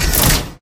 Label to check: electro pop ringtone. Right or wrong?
Wrong